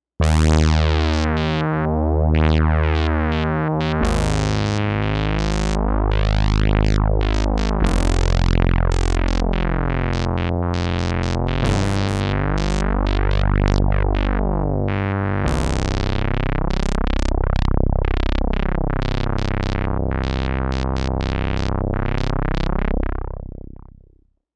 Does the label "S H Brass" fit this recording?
Yes